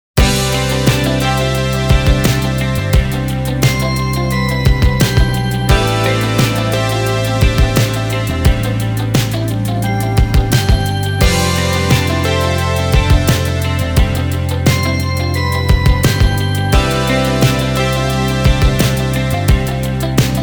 guitar pattern

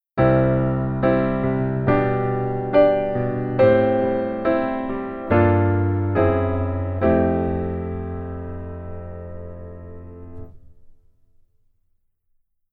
Keyboard

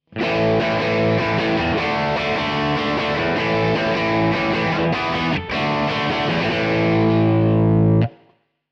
guitar Power Chord

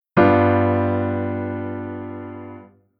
Chord Inversion Basic

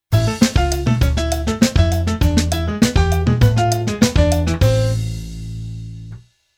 Keyboard Pf Latin

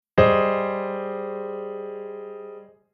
Chord Hybrid

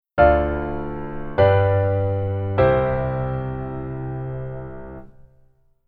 Keyboard Voicing Basic Interval

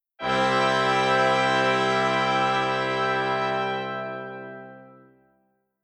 Braass Close

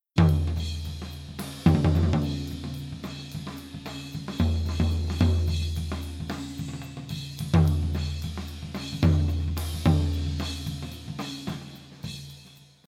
Drums Tom F Tom

